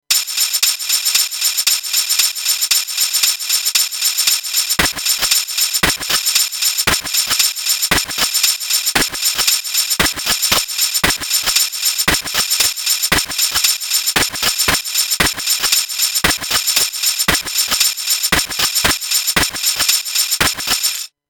WMD Chimera FX SR steps

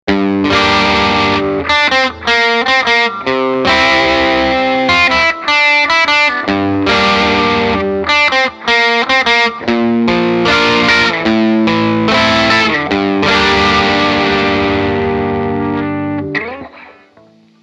Gretsch G6129 Bridge dist

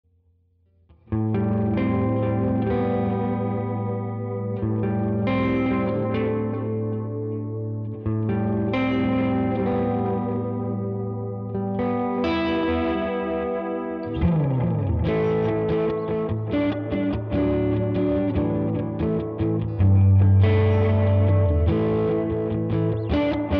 UAD Galaxy some Sounds

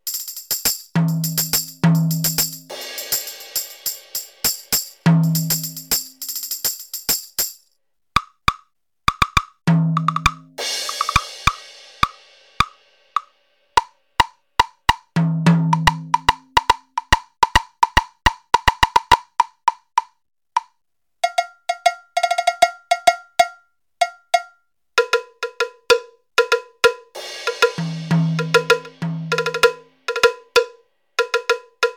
Kit Percussion; ERNOTE5

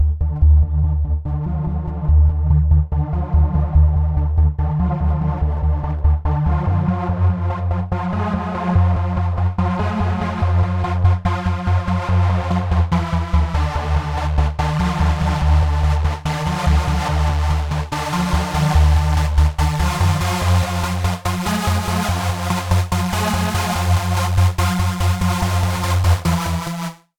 synthmaster wavetable delay digital 12dB

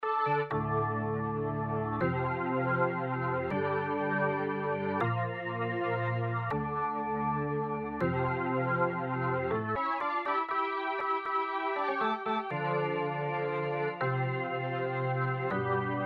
Blofeld E Organ

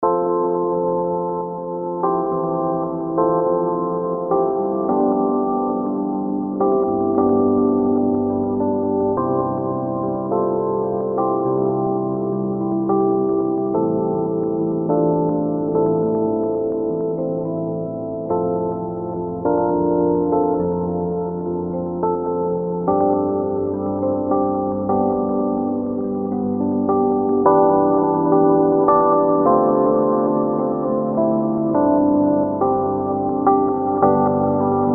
EZkeys Singer Songwriter; MK Dreams

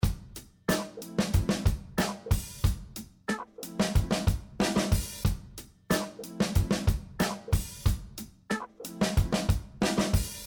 4 Loops 3 Voice

